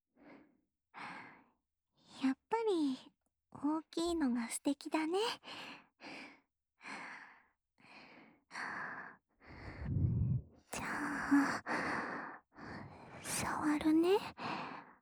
気持ちいい耳舐めと手コキ（右耳）, ASMR; 気持ちいい耳舐めと手コキ（右耳）

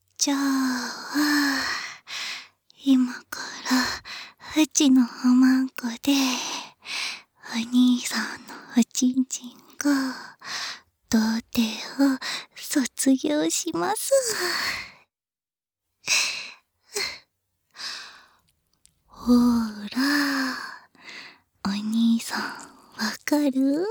耳舐め 生 セックス

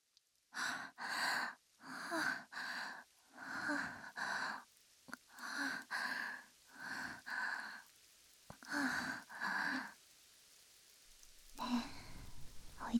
耳かき 耳なめ, 耳、噛んでます; 師匠

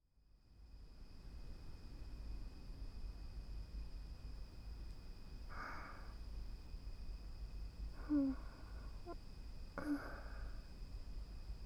特典トラック 寝息（右側）

